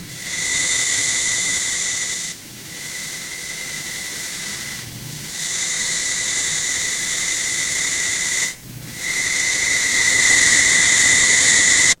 ambience room tone

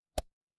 ui pop

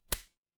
paper fabric cloth whip

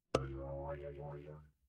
cartoon boing

